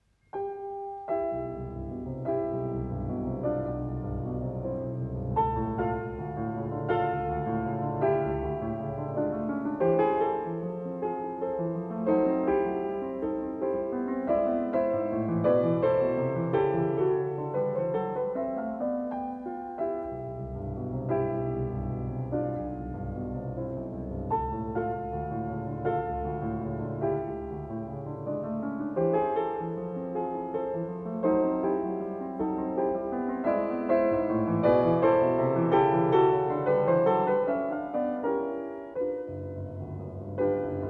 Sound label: Poco vivace, C major, piano; Beethoven; Diabelli Variations in C major, op.120; Brilliant Complete Beethoven Edition